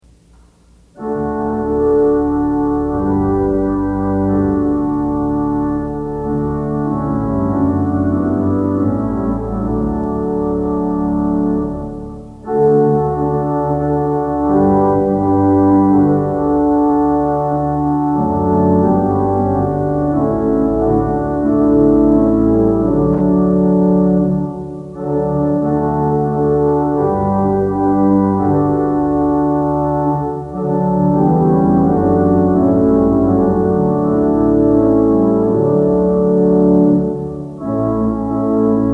Thine Advent, Lord, We Hail, Organ Music for Worship Services; Twenty-Eight Liturgy Hymns